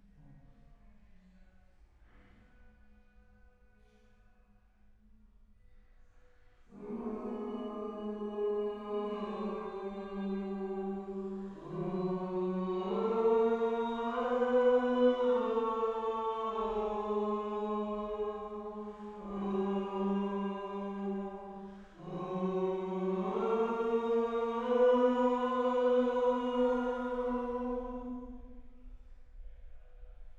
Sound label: Choir